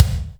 Kick, Akai MPC-500; Toolkits; Kick TKit

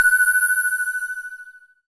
SYN SPACE; MicroWave; Sound & Vision - Gigapack I CD 2

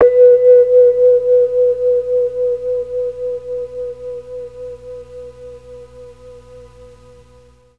B2 VIBES, Mellotron MKII; Mike Pinder - Mellotron Archive CD

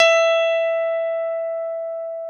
PNO, Electrics, Grands; CP70; Club-50 - Foundations Roland

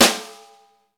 snare, Roland; Club-50 - Foundations Roland; Ext.Snare 3

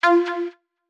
Lead - F; Instrument Oneshots; 99Sounds; Monosounds

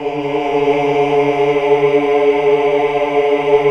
AAH UNI

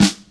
SNR, DRUM ZONE; Masterbits - Total Zone

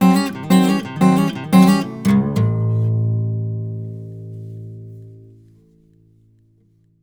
BARI LICK, Blues Guitar; ILIO; Fretworks

